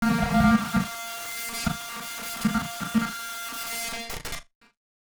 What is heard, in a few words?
Battered Synth; malfunctioning synth samples